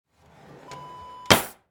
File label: kitchen drawer close